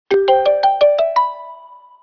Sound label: Live Talking Message; Project Wing; Flyinsono